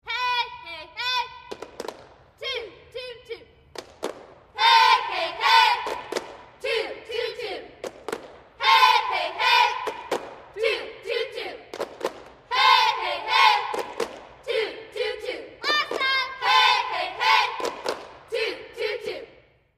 Cheerleaders High School; Cheer 8; Hey Hey Hey, Two Two Two, Exterior Perspective